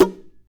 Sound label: NOISE, guitar